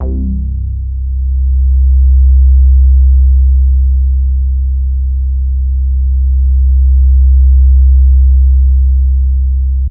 Big Synth Basses

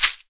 shekere

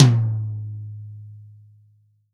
REAL DRUMS POP KIT

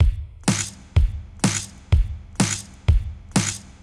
Yokohama 125, drums loops; RoBKTA Sample Pack Supreme

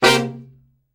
Killer Horns, SECTION 7TH; Best Service

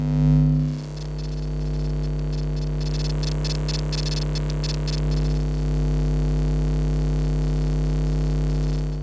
Electromagnetic Fields

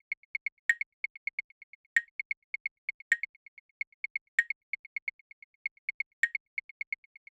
Clave Loop 130, Perc Loops 130, Loungin House; Equipped Music

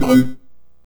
Synth Hit C; Zero-G Trance Inducer; 90 s Sample CDs

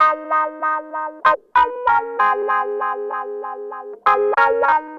Clavinet, 70s Analog Funk; Sonic Foundry (Sony Creative Software); What it Is!

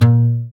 GUI AC, Acoustic Slap, Roland

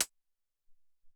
Tek Hat, Alesis SR-18

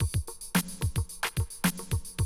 Classic DrumLoops, 110

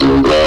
VOX GENERAL; AMG Megabass REMIX! VOL-2

